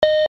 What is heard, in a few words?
control beep